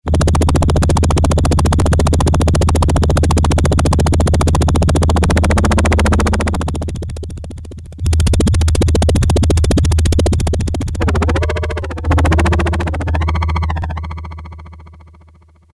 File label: Helicopter, Yamaha TX81Z